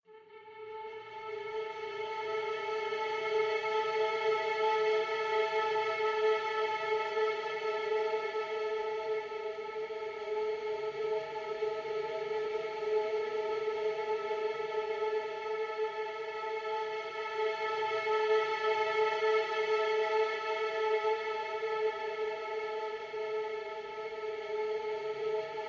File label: Ambient